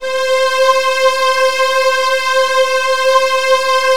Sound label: Virtuoso Strings